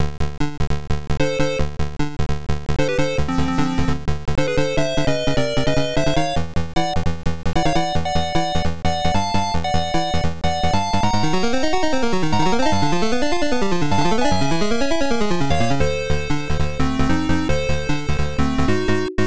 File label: Stage 2 BGM; Garudius 95; PC-9801